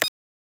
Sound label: Click Lock Beep; FREE SFX PACK VOL 2